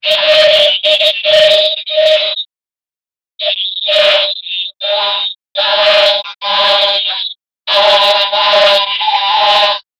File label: a humanoid robot screamin